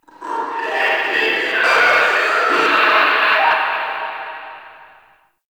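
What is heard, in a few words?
Infested Distant, Ambience; Nineteen Ninety Nine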